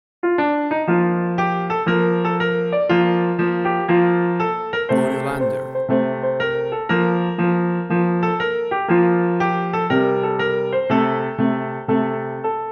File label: Belgium National Anthem Piano